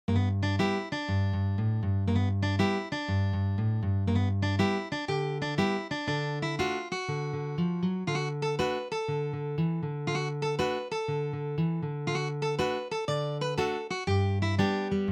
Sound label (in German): schlaender blues